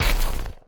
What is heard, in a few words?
enemy explode; CrossCode; battle